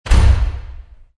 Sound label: door vault left